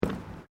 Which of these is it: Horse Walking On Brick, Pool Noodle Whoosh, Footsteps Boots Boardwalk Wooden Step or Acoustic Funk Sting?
Footsteps Boots Boardwalk Wooden Step